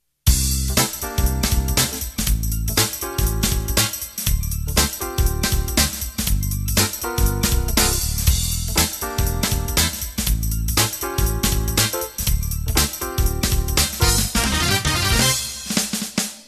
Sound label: Groove Funk Intro